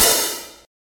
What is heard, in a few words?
Acoustic Open Hihat